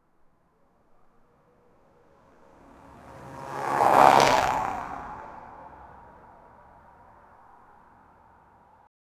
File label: a group of 20 peoples, sprinting